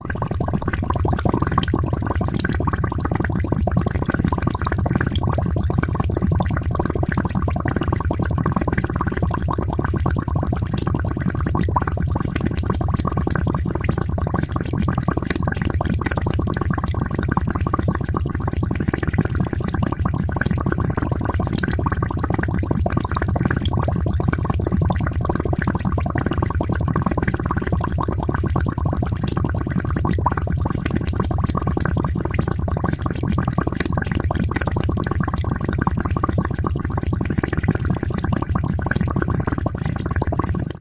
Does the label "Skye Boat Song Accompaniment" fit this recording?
No